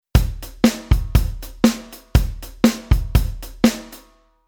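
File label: Rhythm Snare Drum Push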